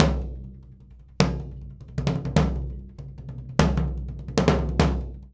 100 SURDO; Univers Sons; Basicussions

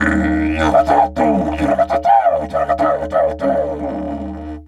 DIDGE WILD, Didgeridoo; Big Fish Audio - Didgeridoo and Other Primitive Instruments

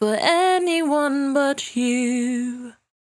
68bpm D, Velvet Vocal; Zero-G

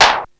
clap; East West; Global Sounds

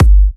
G, kicks; markus hakala kicks

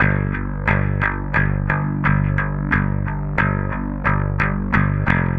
Bass

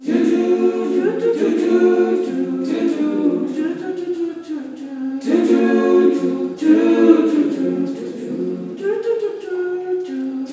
SWAY CHR, FEM CHOIR; Spectrasonics; Heart of Africa 2

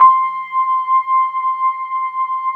FENDER C5; USB Soundscan vol.09; AKAI; Keyboards Old School

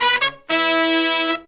96BPM, Horns; Phantom Horns; 90 s Sample CDs; Zero-G

